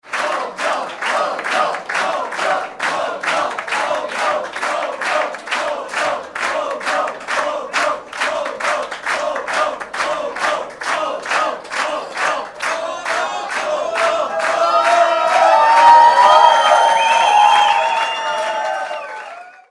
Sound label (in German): rufe